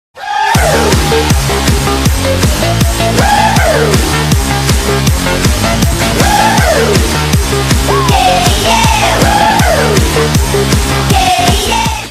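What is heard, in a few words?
nightcore SMS message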